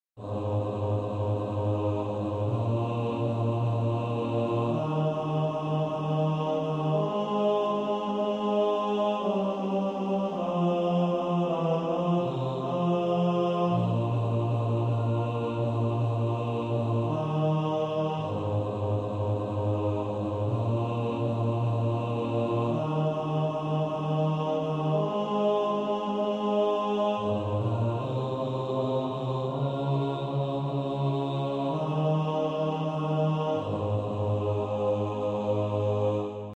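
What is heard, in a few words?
bass, Practice Music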